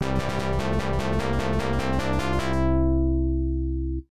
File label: Fanfare; rune worth kokui no kikoushi pc-8801 opna